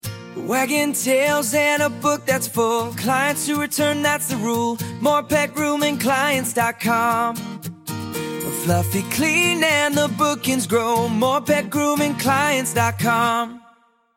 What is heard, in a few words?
jingle